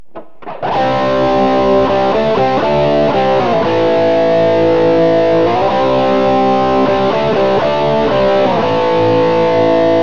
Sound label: bridge crunch; fralin; Burny